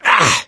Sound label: hurt minor, gambler, survivor voice